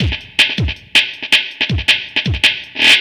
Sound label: Beat, Futurist Drum & Bass; Drums & Percussion; Beats